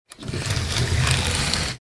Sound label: Heavy fabric curtain slide rail rattle; household